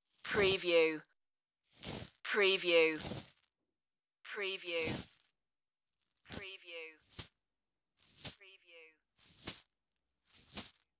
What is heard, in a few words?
gravel drops